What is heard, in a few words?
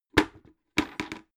bucket put down heavy handle hit